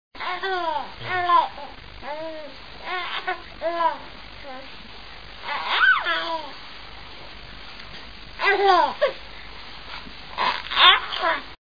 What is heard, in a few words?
giggly girl; October 13: Giggly Girl